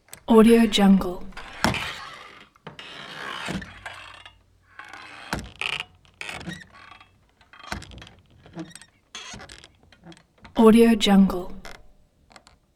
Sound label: door swinging saloon